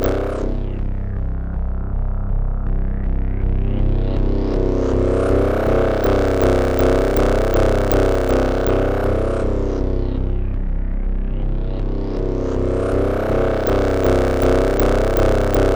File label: C1, SynthLine; 90 s Sample CDs; NiceBeats; SynthLine VOL-1